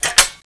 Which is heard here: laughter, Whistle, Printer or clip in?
clip in